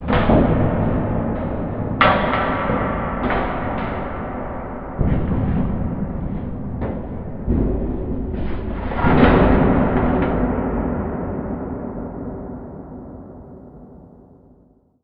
big hit far

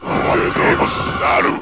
monster chant

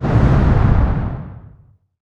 woosh

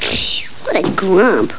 grump

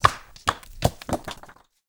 rock hit

